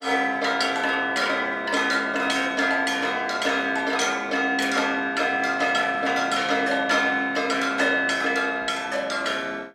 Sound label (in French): cloches